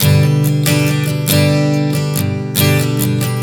Strum 070 G, One Chord Strumming 070 bpm; Sonic Foundry (Sony Creative Software); Songwriter Acoustic Guitar Companion

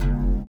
LIQUITAR; Spectrasonics; Metamorphosis

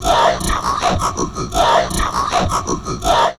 VOCOD, Drum Loops Crazy Processed

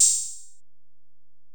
HiHat, Alesis DM-PRO

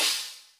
Clusher, Drum Machines, Yamaha DTXpress, Cymbal

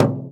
FRAME, INDIAN PERC

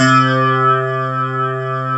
NASTY CLAVI, DANCE

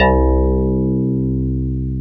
FM Rhodes; Club-50 - Foundations Roland

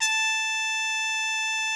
MUTED A4, TRUMPETS; Greytsounds VOL-1

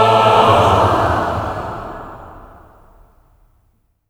OCT FALL, voices; OCT.FALLS

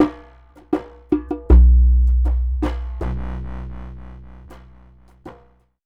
Klong Ya, Worldly Rhythms; Throne; Sonic Foundry (Sony Creative Software); Ma Ja Le Ethereal Textures

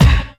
BD, Drum & Bass, 150 BPM; Ueberschall; Drum & Bass Resonance

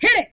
VOX, VOCALS; Ueberschall; Jam Box

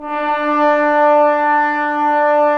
BRS BNS, Roland LA Composer

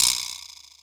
kit, Yamaha Motif-ES8

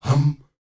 hum hum, S. African world voices; ILIO; Vocal Planet

